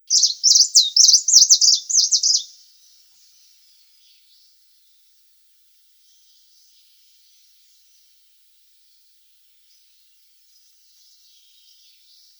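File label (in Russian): Penochka zheltobrjuhaja, sulphur-bellied warbler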